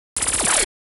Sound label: 123 Em Sfx, Complex Electro Synths; Prime Loops; Onyx